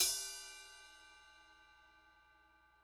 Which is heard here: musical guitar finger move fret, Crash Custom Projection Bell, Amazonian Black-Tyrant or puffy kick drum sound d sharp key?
Crash Custom Projection Bell